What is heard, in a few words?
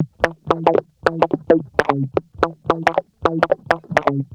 Guitar Loop; Ambient Grooves